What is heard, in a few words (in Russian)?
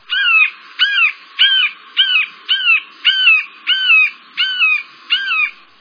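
оглушающий крик хищной птицы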